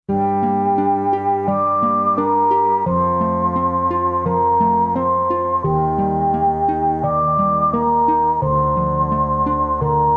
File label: Sad Heroine; Chaos Seed: Fuusui Kairouki